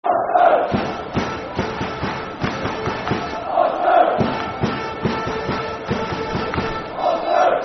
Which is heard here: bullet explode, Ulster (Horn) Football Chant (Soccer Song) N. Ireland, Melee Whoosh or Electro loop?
Ulster (Horn) Football Chant (Soccer Song) N. Ireland